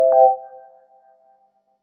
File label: chat connect